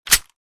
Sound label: 9mm handgun mag insert